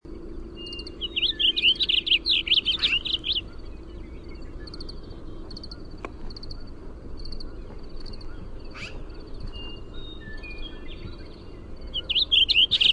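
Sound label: Warbling Vireo song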